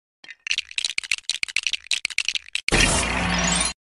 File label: Lego pieces